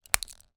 household medicine tablet large remove pop out of foil blister pack; zapsplat